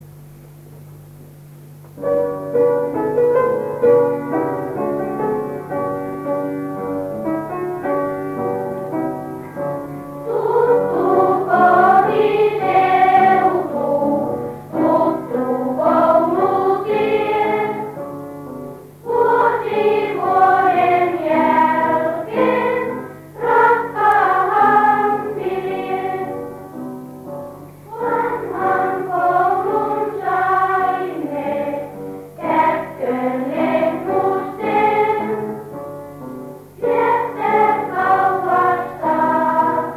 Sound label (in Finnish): juhlakantaatti lapset laulavat